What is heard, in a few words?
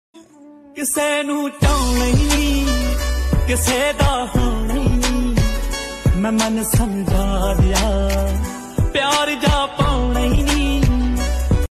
Punjabi song heart touching Punjabi sad